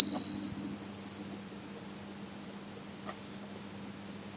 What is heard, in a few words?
tuning forks, large intestine